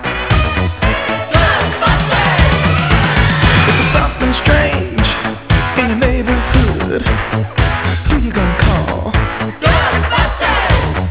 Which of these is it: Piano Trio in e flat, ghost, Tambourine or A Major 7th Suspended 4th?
ghost